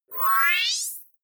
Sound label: screen turn on bubble; energie